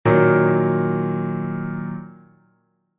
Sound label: D♯m, chord inversions